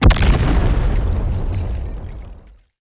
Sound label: black cannon